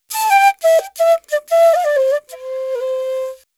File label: ETHNO EXTRA 90; ethno2